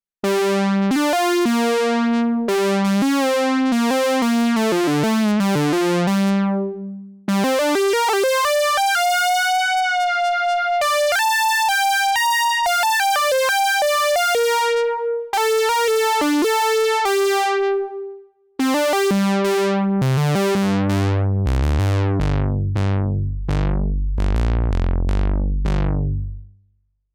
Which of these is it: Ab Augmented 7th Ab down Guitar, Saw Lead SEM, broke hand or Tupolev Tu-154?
Saw Lead SEM